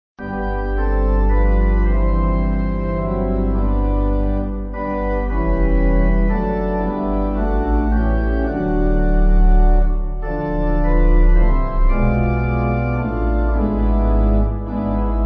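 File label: Stainer Pipe, Pipe Organ; Author Of Life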